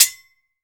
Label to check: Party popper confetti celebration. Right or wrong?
Wrong